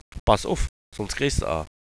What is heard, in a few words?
bass uff